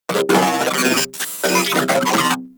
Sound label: Moa Vocal Attack; Warframe